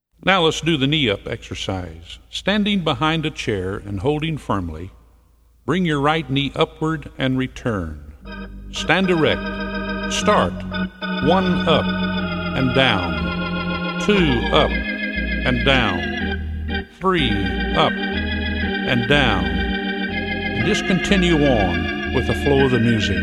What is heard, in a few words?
Chair Exercises